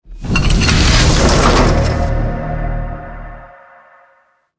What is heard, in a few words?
ui crate display; Counter-Strike Global Offensive